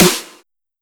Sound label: electronic neurofunk